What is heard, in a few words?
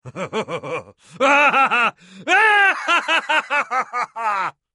Laughing